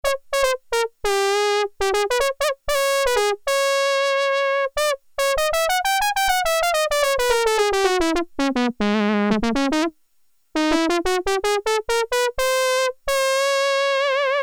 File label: Solo Brass, Korg Poly-61M